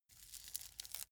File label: velcro large close